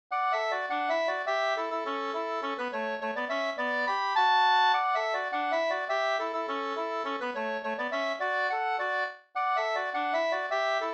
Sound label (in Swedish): polka polkett; Nr1 fr Froson 2st